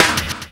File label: Feel Break